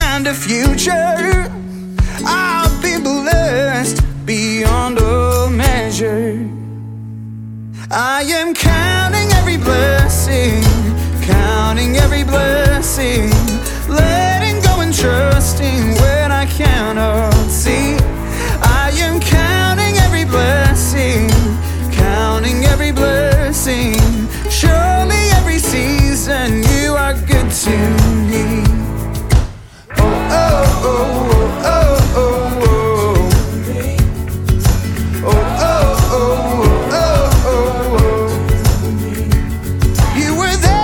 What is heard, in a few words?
ukulele session